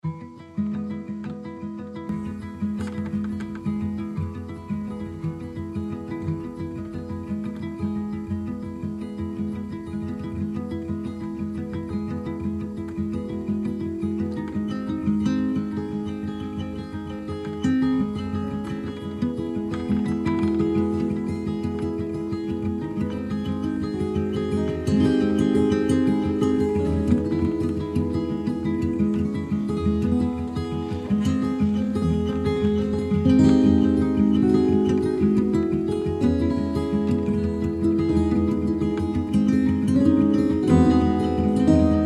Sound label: Music for acoustic guitar